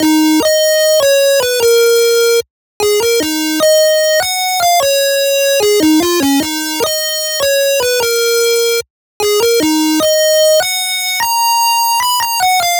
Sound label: Lead Loop D# Virtual, 150bpm; Dubstep; Ghosthack; Dubstep Sound Arsenal